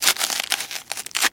bone break cutting flesh

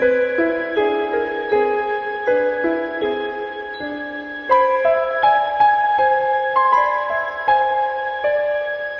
keys, Loops, Sad

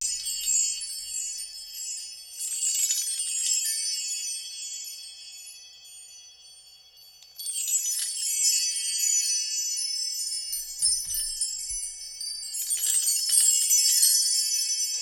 chimey chime chime; Rumbles

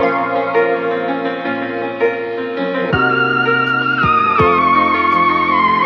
piano and keys, Loops; Phonky

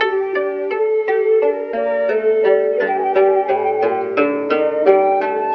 guitar kung fu

